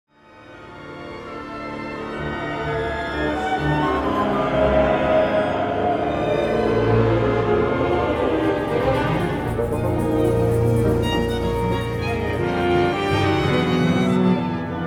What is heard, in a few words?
musical signature